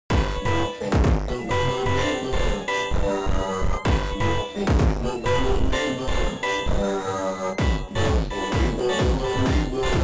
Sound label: FUNK 3d rotating